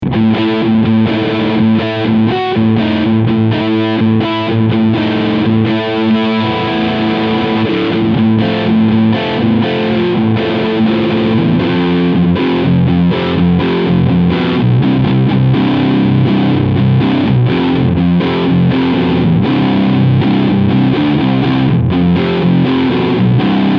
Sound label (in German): Charvel DK24 7 Riff Sound beide Humbucker